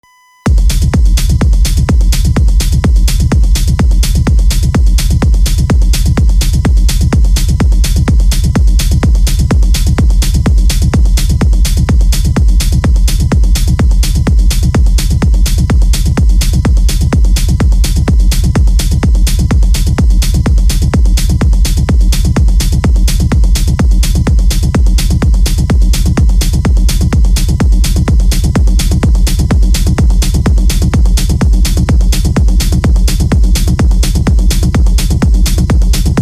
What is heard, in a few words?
techno live sets, podcast techno